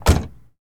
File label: Z28 Door Close; Automobiles